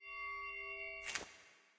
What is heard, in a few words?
angelic victory; smite; thanatos